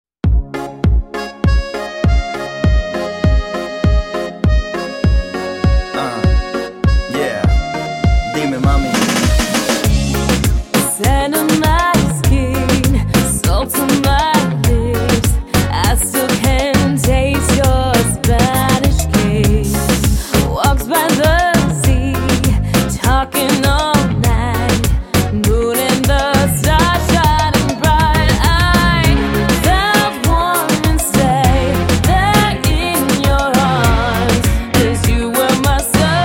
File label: SUMMER LOVE Reggaeton